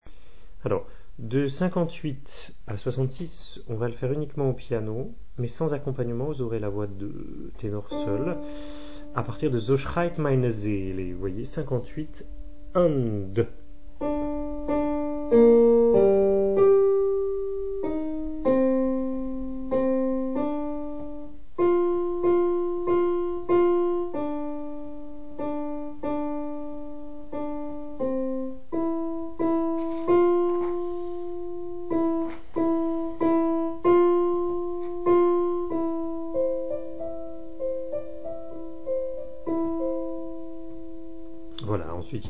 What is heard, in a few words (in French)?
psaume 42 piano